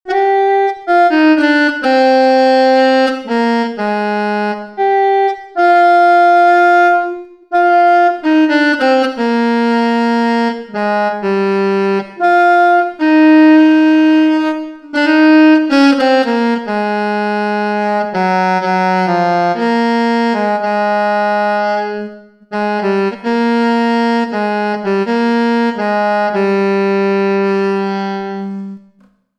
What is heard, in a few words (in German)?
Altsaxofon Travel Sax